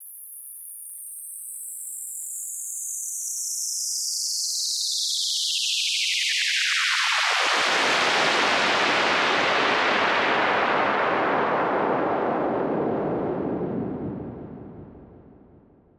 FX Down

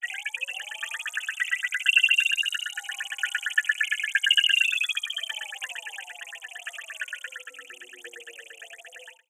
fx telemetry

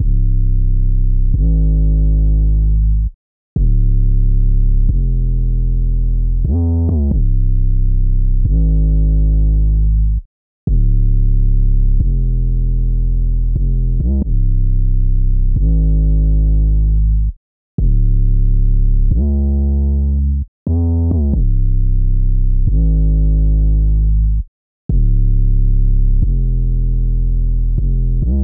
808 Bass C-m

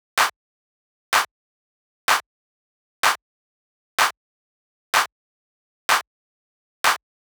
126 Swinging Clap Snare

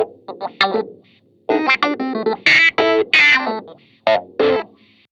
Clav Wah 95bpm lick

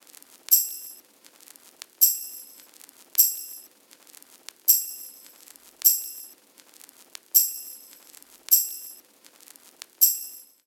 90 Dusty Tamb

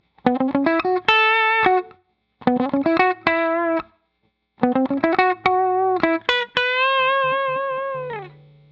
Guitar Loops Funk A Dry

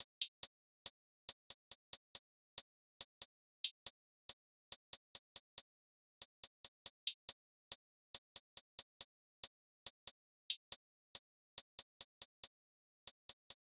140 hihat loop; on ur own

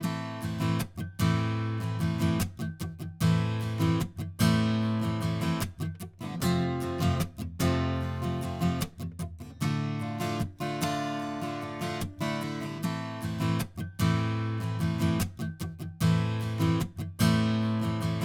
A min 150bpm Acoustic Guitar Chorus

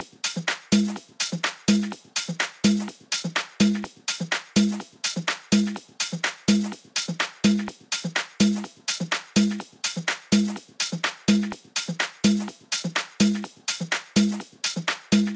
125, toploops

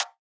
Percussion Gm Additive Modulated

